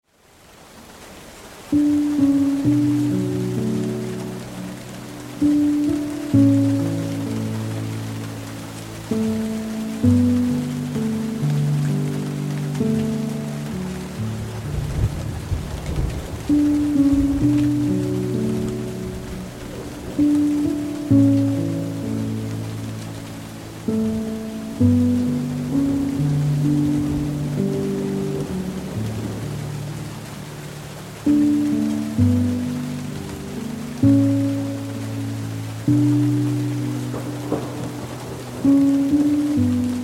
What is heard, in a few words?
Midnight (Rainy Mood), sad violen